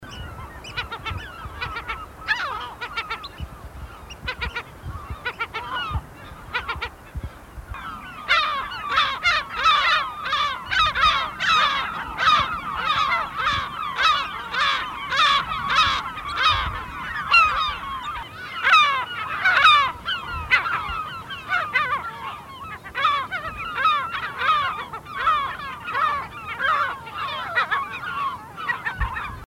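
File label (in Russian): klusha golos, lesser black-backed gull voice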